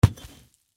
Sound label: Impact Rock Drop Brick Stone; Rocks; Materials